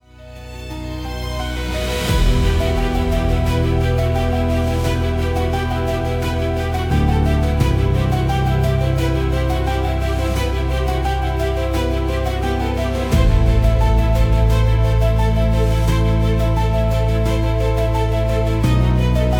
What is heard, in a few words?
Suspense, cinematic soundtrack; Tuneify; cinematic soundtrack pack